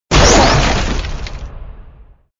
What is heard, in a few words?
transition out; moonstone madness